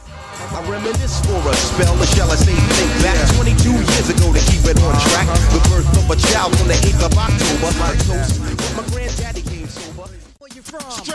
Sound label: Old School Hiphop Megamix 102 128